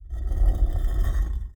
scroll monolith

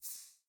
mouse over generic

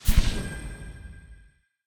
map click timeline

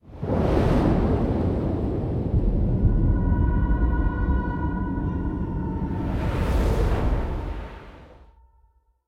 intro logo

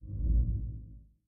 map black circle appear

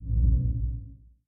map black circle appear

